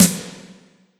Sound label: Yamaha RM50 Reverb Snare